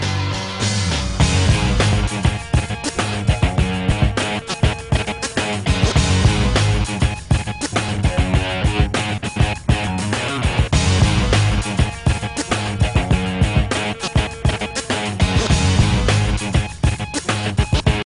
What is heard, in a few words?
electronica